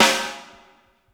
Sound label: AMBIENT, WOOD; Sampleheads - New York City Drumworks VOL-1